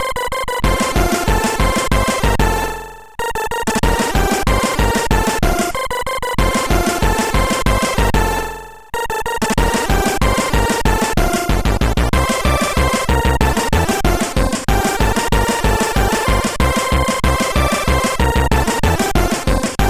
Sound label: Polka sid CSG8580R5